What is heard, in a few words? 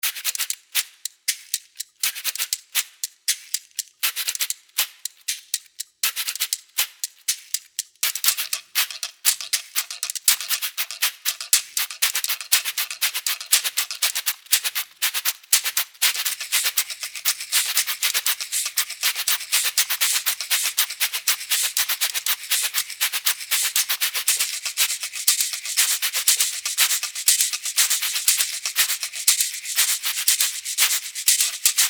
afox